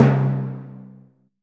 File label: TIMP C2, timpani; AKAI S6000 CD-ROM - Volume 5